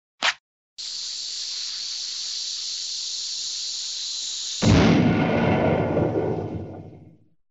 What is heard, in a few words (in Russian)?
zvuk zapala fitilya i vzryv